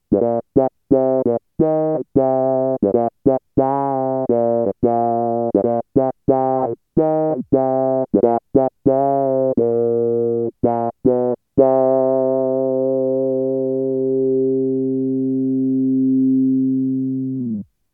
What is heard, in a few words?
auto wah